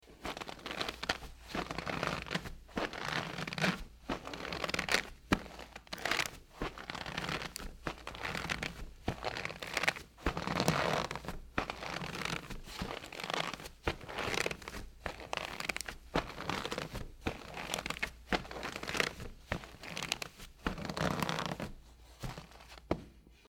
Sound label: Snow Trudging; Todd Blankenship